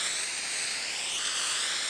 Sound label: Spray Loop